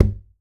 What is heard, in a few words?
cajon; Voice Crystal - Steve Reid Definitive Percussion